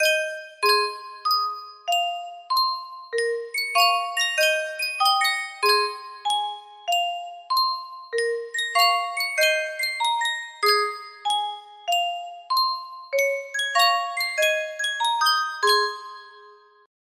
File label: Sankyo music box, music box melody